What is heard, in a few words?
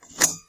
key close